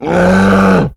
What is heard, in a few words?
Grunt Bear